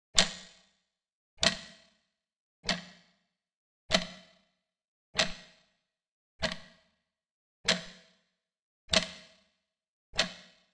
Old vintage clock reverberating in a hallway

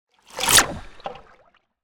Cinematic water whoosh

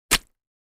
Cartoon splat

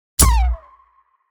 Blaster futuristic weapon single shot

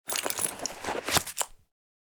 Purse taking item out

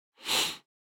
Human smelling inhaling

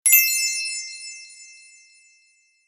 Twinkle idea ding; positive

